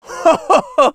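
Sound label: laugh happy, French; Scout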